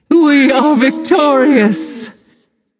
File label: "yay"; smite; voicepack; nuwa